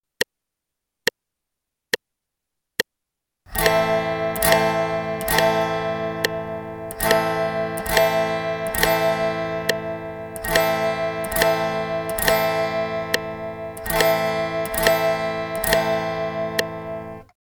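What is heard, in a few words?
G strum quarter notes pattern 2 Am, guitar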